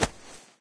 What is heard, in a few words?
foot; npc; fast zombie; Garry's Mod; hl2